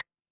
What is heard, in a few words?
ui hover